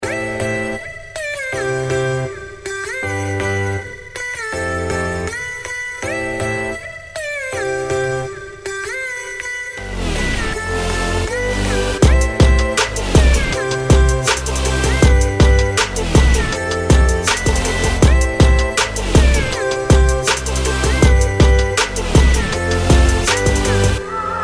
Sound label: Modern Pop Hits; Big Fish Audio; Pop Life